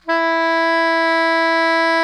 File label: SOPRANO E 3, Saxophones; MF; Greytsounds; Philip Wolfe David Crozier's Saxophones